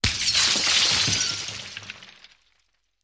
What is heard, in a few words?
Glass Crashes Design; windows & mirrors